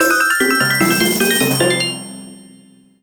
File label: Jingle Item Get; Tottoko Hamtaro Nazo Nazo Q Kumo no Ue no Hatena Jou; NDS